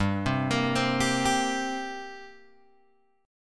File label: G Minor Double Flat 5th, Guitar, Open G